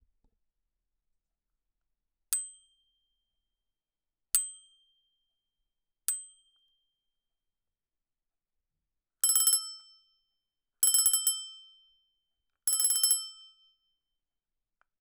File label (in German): Klingel vs. Drehklingel